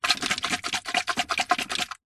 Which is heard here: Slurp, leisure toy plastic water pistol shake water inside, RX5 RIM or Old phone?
leisure toy plastic water pistol shake water inside